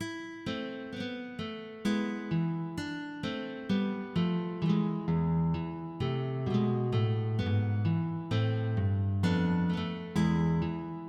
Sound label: Gigue